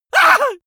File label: male painful shout short; vocals; comedy-cartoon; soundpool